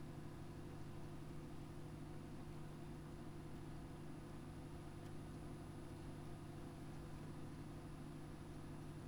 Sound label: Hydrophone; OneOcean